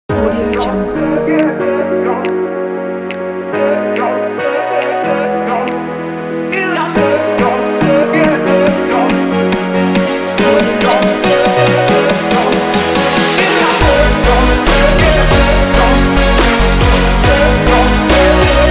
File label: future bass